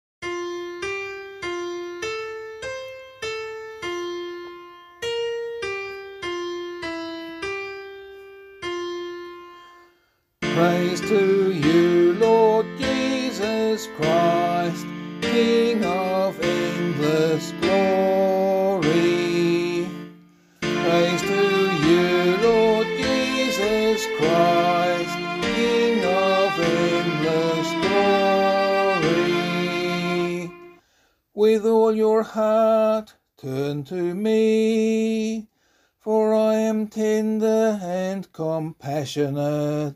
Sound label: vocal, Gospel Acclamation; Lent 5; LiturgyShare